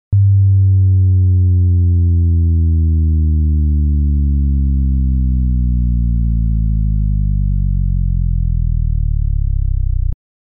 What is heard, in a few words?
Bass Sweep